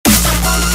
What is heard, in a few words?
Dubstep